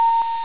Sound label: electronic ping short; Roblox